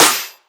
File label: Snare Tribal